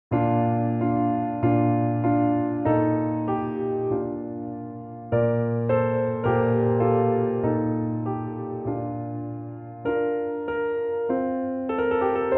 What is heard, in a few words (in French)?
piano virtuel; noire